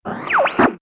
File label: Radio Transmission Start; Learn to Fly 2